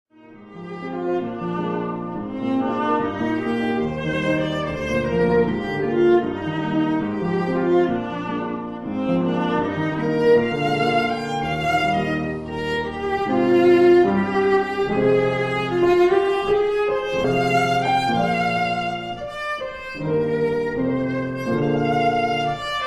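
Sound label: Phantasiestücke for Viola and Piano Romaze; Reinecke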